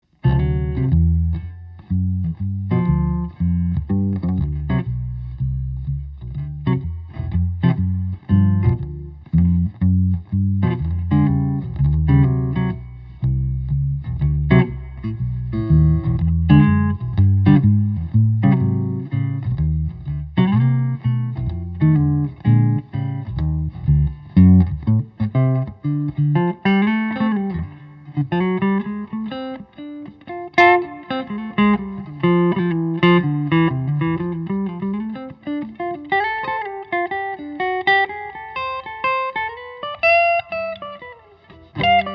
Monteleone Radio Flyer 7 String w Amp, archtop guitars electric; maple; spruce